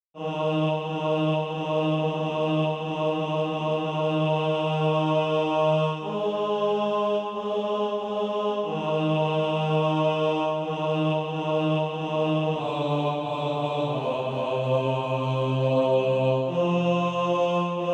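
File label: bas, Practise Music; Choose Ye Today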